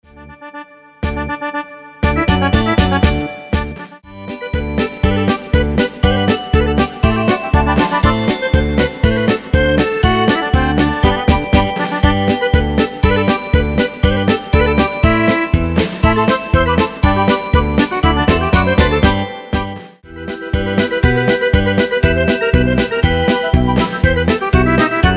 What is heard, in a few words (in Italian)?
Fisarmonica In Festa Polka Canto